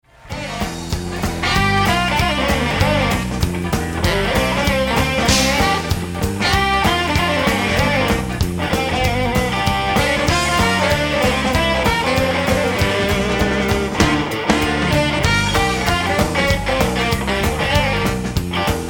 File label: shuffle